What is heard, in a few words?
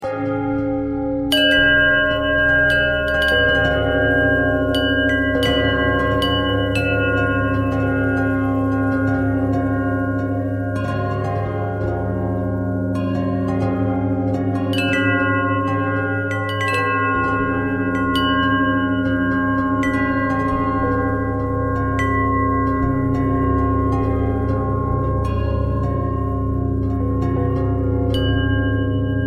bell bell thread simple, bells